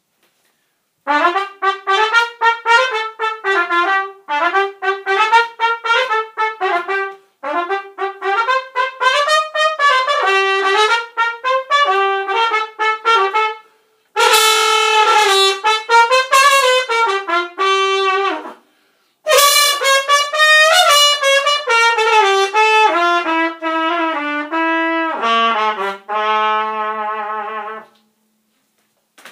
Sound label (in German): Trompete; Klangproben Instrumente